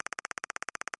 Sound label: message display, button